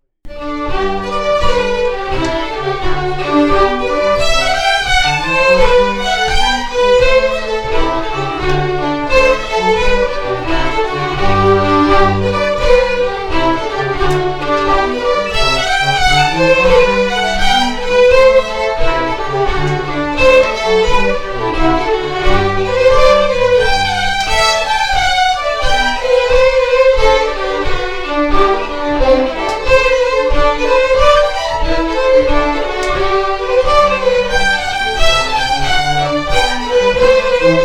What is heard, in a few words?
Jigg; The Jink & Diddle School of Scottish Fiddling